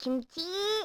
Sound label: Camera Read; LG SV730